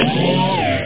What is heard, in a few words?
robo; vcop